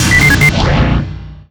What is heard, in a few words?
sentry buster ping